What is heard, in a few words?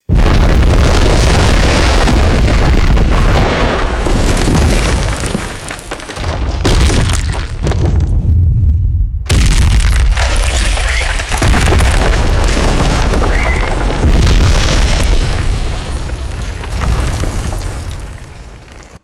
heavy earthquake debris rockfall and rumble